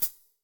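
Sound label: Cymbal Wet Foot, Drum Machines, Alesis D4; 300 Drum Machines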